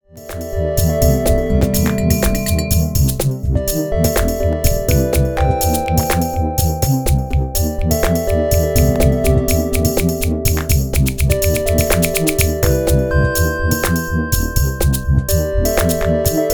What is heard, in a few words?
Dexed Reverb; Zynthian Open Sound Plattform; Zynthian Ensemble